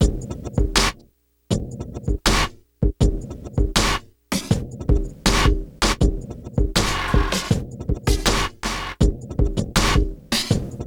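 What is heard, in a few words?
Loop, beats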